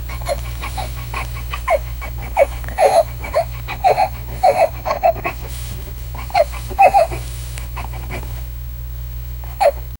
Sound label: fat dog giving bi, birth; colette